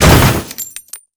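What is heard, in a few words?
big treasure box spawn; monster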